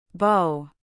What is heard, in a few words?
bown